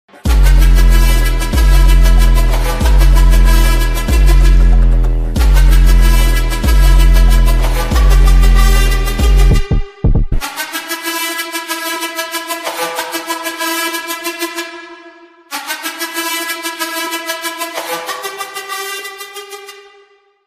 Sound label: Background Music Ringtone